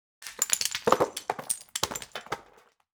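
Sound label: tripmine explosion debris; weapons; Half Life Alyx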